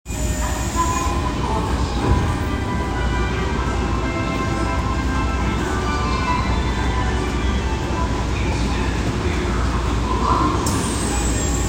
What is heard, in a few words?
train sounds; 有楽町線地下鉄赤塚東